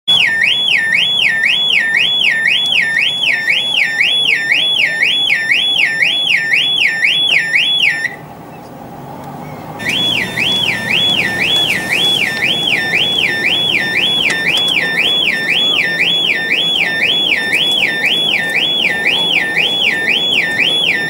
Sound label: car alarm